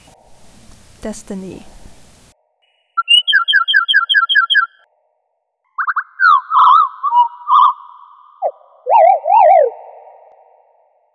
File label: birdcall